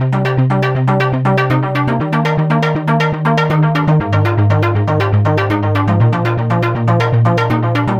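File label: synth loop trance land 120 C minor; Virtual Riot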